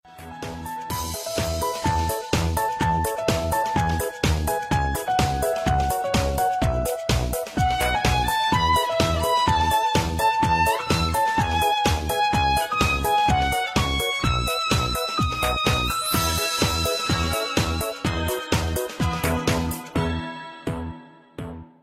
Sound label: Oppo sound Ringtone